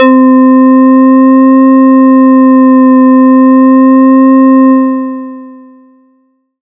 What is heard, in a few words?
SY TANGO; ModelCycles